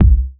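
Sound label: bass drum; Wizoo - Platinum 24 Electronic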